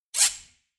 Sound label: Swipe